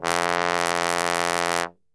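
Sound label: F bass trombone, Brass Super Section; Best Service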